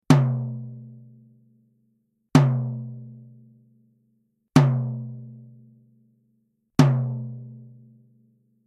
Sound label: TOM Clear Hard; evans; naciag do tomow uderzany